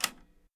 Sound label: Door Pneuma Click